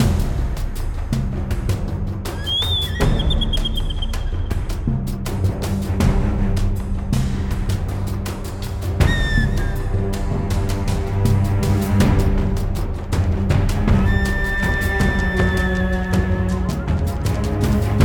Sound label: BGM Battle; hidden dragon legend